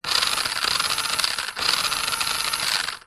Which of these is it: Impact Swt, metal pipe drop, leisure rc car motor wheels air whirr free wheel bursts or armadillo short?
leisure rc car motor wheels air whirr free wheel bursts